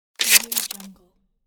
scissor paper single cut

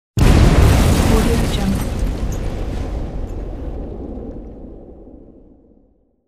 explosion

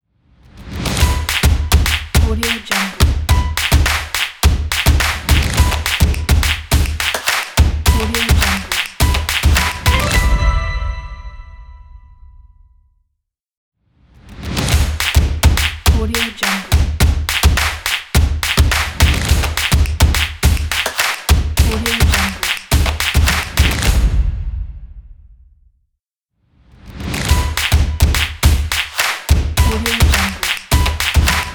powerful drums and stomps claps logo

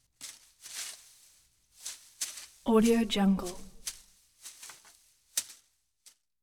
rake leaves

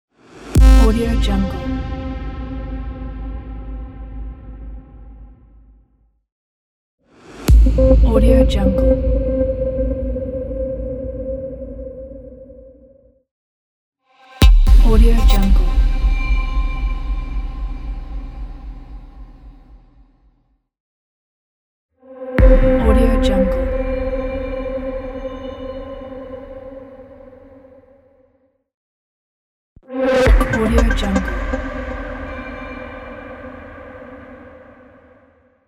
impact electronic; teasound

